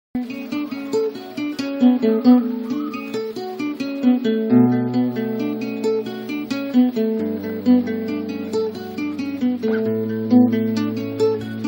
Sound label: Acoustic Nylon